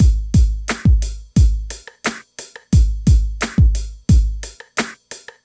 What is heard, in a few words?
drum, Hip Hop and R&B Drumloops; Best Service ProSamples vol.01; AKAI